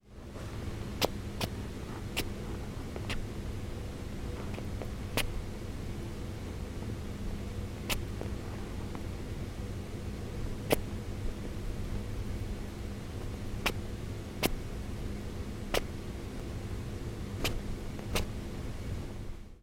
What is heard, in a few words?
sneezing; Grooming sneeze1